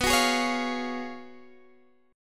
Gb Major 7th Suspended 2nd Suspended 4th

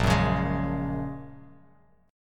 Bb Minor 7th Sharp 5th, Bass Standard; strummed; JGuitar

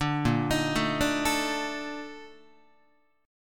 Bb Major 11th Guitar, chord; arpeggiated; JGuitar

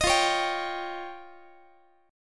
F 7th Suspended 2nd Sharp 5th, Guitar; F7sus2#5 strummed

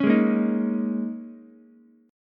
G sharp Suspended 2nd Suspended 4th, Ukulele; strummed; 6,8,6,6

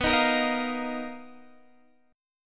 B Major Sharp 11th, B, down, Guitar Open G

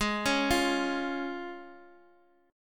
C sharp Major Ab; arpeggiated; JGuitar

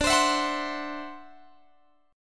D 9th D down Guitar Open G

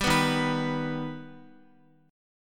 Eb Augmented Eb, Guitar; Eb+ strummed; JGuitar

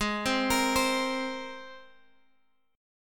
G sharp Major Add 9th G sharp, Guitar Open F; G#add9 Chord

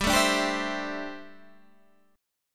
D 7th Suspended 2nd Suspended 4th, G

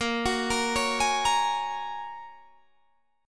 Bb Minor 7th Sharp 5th, Guitar, Half Step Down

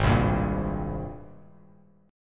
Ab Major 9th Ab down Bass Standard; AbM9; strummed

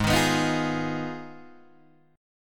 Ab Minor 6th, Ab, Guitar, Open D

